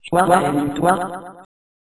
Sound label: Mouth Music, Vocal